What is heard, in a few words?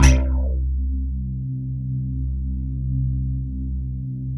BEATBACK C3; Greytsounds; Scott Peers Sound Engineering